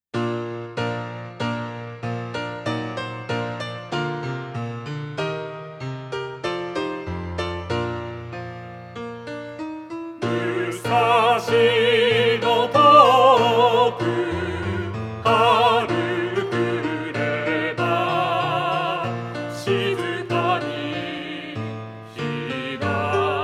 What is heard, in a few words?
piano